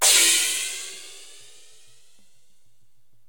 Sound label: pile empty; crazyeights